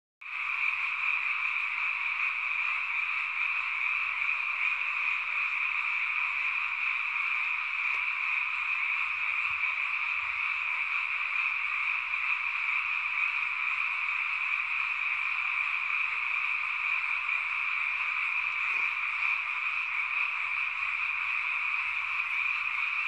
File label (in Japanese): kaeru